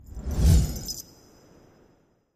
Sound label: symbol Drop; wild worlds